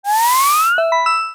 biometric scan pass

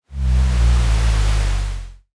dark aura rumble

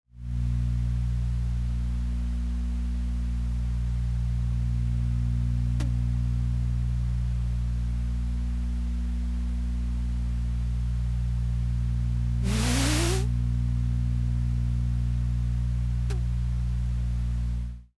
amb podcast room tone

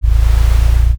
cat purr short

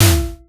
punchline hit

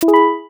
ui unlock bright; buttons ui

